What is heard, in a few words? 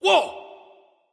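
nonverbal surprised; smite; voicepack; ullr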